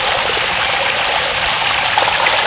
leech water churn loop; Half Life Model Pack; Half Life 2

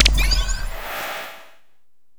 ui affirm lg; Half Life Alyx

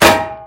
metal solid impact bullet; physics

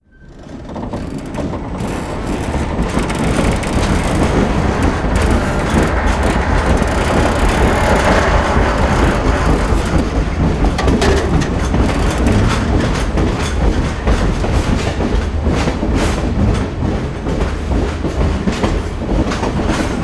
train rumble, machines; Half Life Model Pack; Half Life 2

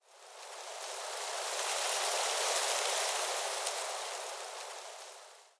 wind leaves mild gust; ambient; nature

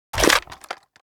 pickup rifle

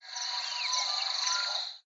shoots jungle bird

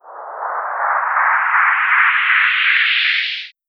riser; Half Life Alyx

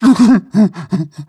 breath long, zombie; creatures

